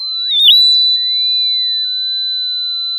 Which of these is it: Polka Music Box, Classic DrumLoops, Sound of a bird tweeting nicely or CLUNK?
Sound of a bird tweeting nicely